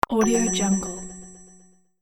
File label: button magic signal